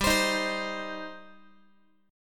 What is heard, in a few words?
G Suspended 4th Sharp 5th, Guitar; Gsus4#5 strummed; x,15,15,13,16,x